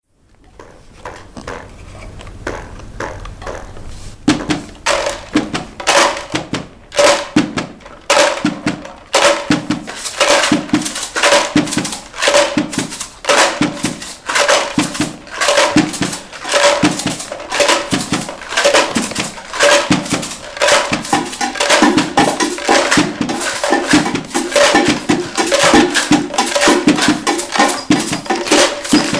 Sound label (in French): mini orchestre